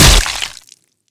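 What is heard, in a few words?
fall of a bottle with liquid from a military bag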